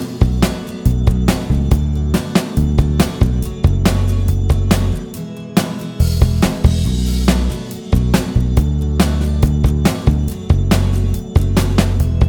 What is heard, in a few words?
Verse loop